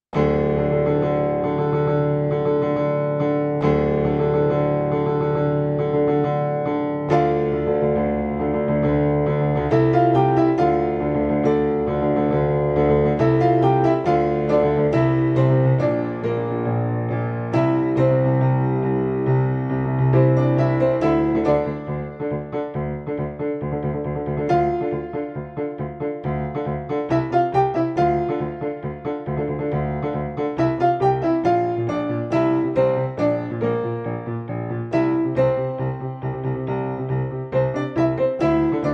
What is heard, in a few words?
Piano; Galatasaray